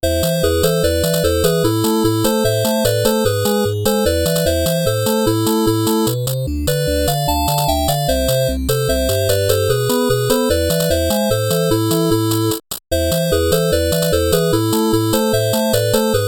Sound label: 8-Bit Tetris Theme 8-Bit Version